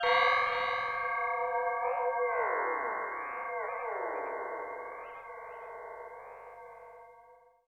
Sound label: ethno 125